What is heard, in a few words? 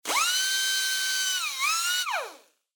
Pneumatic Tool Saw Long Burst; noise creations